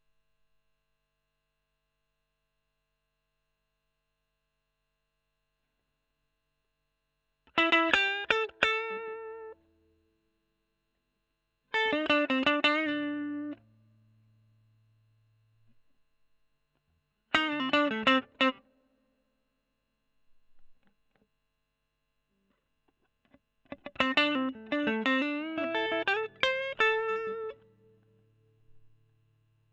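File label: E.GTR OD, electric guitar; Gefell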